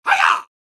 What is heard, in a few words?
Kung fu shout